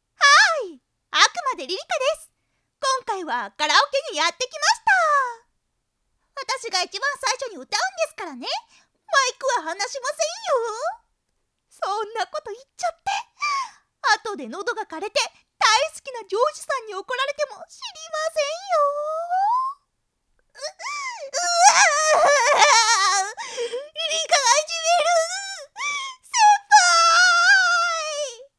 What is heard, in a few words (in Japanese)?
カラオケ; リリカ＆ポチコ